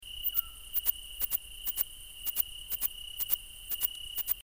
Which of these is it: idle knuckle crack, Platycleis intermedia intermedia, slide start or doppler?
Platycleis intermedia intermedia